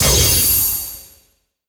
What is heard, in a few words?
Gimmick Breaked